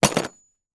industrial tools file put in tool box; sound effects one

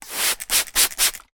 household water spray bottle 4x quick mist sprays; sound effects one

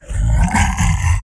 Monster Struggle Grunt; Screams Shouts 2; soundbits

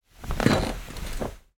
household chair sofa person sit down springs

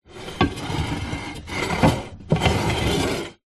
household ceramic fruit bowl movement on table

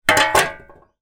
shovel lean up against wall or table; industrial

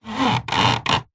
household blind venetian cord pull close up creak

